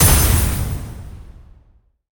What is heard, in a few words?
Mech Griffin explo; monster